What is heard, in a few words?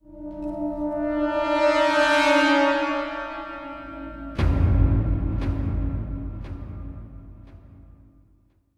Music Shorts